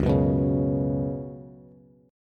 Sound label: Db 7th Suspended 4th Db down Bass; JGuitar